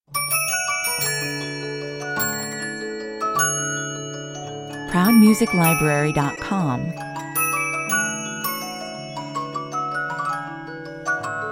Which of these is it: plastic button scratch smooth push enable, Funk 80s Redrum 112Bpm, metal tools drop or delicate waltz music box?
delicate waltz music box